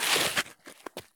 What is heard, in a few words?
stop asphalt; Escape from Tarkov